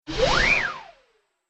Whistle Whoosh; Whoosh Sound Effects

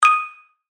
Multimedia Chime, Technology, Multimedia, Chime; Chimes

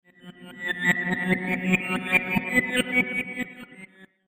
Scrambled Sci Fi Data Stream; science fiction sound effect; Andrew Fleming

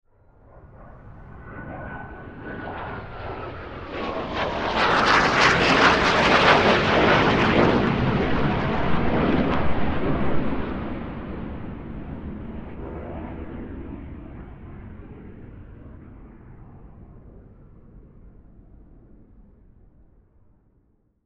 Jet Fly By Pass Overhead; Airplane; Warfare; Blue Angel; Airplanes; Blastwave

